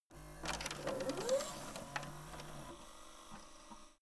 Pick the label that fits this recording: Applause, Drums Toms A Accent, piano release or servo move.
servo move